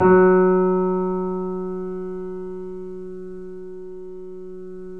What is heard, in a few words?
F#, 3D Pianos, Boesendorfer